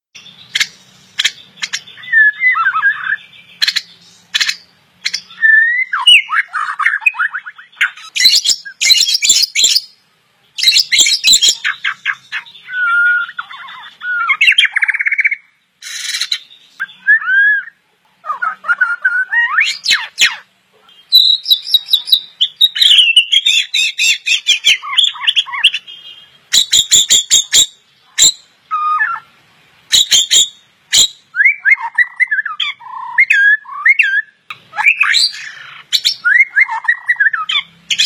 Suara Borneo Full Tembakan

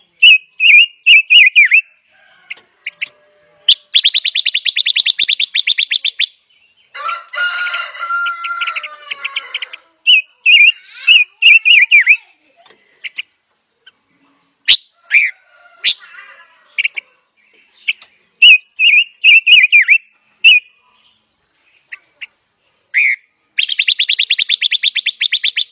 Suara Burung Panca Warna Gacor

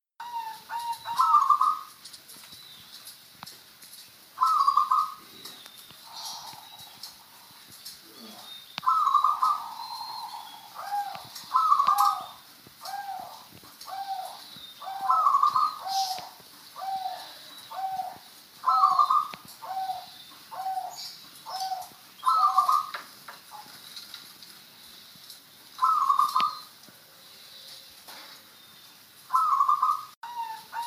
suara perkutut songo ratu

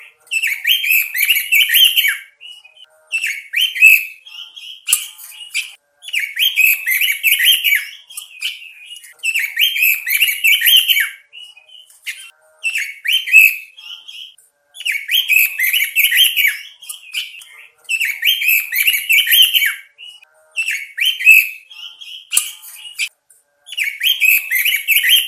suara burung robin jantan gacor

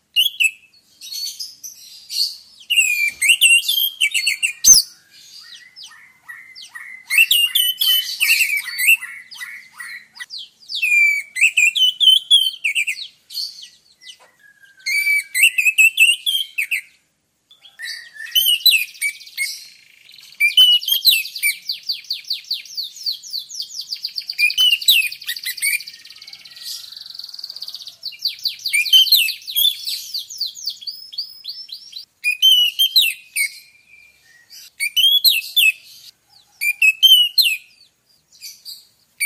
pancingan suara burung anis merah agar rajin bunyi

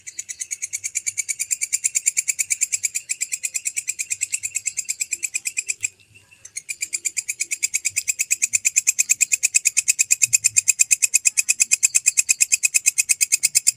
suara belalang kecek

